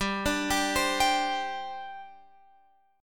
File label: G Suspended 4th, Guitar, Open F; Gsus4 arpeggiated; x,14,14,14,15,14